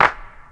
clap sound c key, percussion single hits one shots